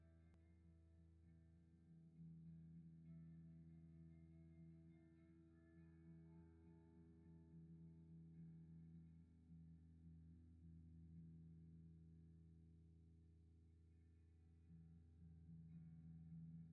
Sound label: ELECTRIC GUITAR; NAMM 2018; MyMix Recordings NAMM 2018